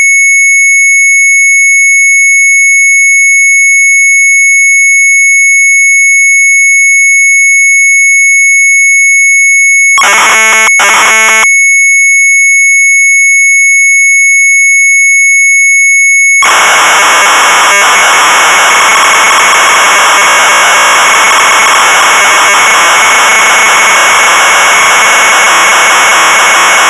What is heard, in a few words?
MZ800 Tape, sharp; PAVANE; retromelsarchive